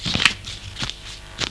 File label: elk; Little Red Riding Hood